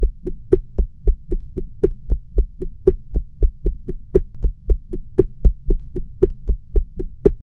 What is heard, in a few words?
rhythmic shushing sound